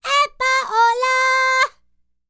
Vocal Planet World Voices, NOMADIC

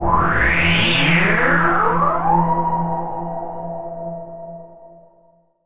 PROPHE FX, SYNTH FX; AKAI S-Series CD-ROM Sound Library VOL-6

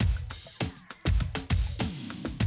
drum loop, 100 BPM

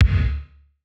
Kick, Gated Reverb; musicradar

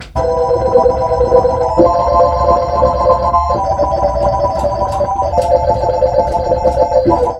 ORGAN LP; ORGANS; Ragga & Dub Reggae

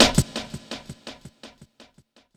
Step Ez Cut, drum loop, jungle, 168.5; Zero-G Jungle Warfare 1, 2 & 3

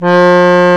BASSO F4; Roland LA; KBD

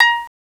VIOL PIZ, Fairlight CMI, STRINGS; STRINGS3

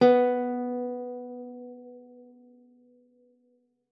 B3, guitar; Xsample VOL-2

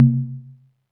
CONG TOM, CONGA BONGO

